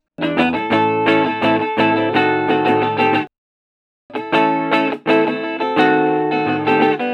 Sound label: chord mix